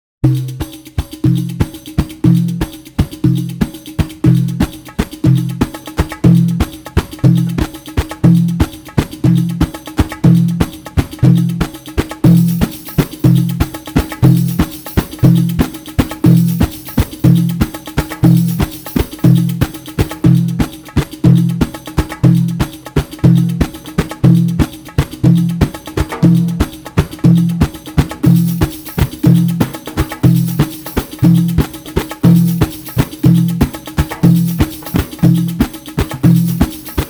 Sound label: Malfuf, belly dance rhythms; Jwaad